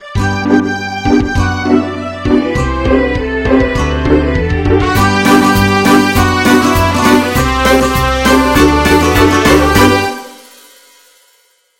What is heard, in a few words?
Theme To Orchestral; Adventure Time